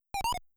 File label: Miss; mother1